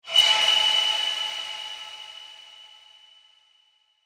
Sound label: Metal Scrape High, Horror, Production Element, Imaging Element, Accent, Transition; Warped